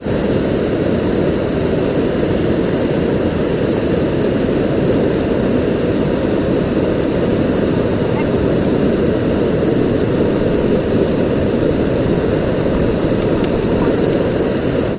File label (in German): gas; schweden 2002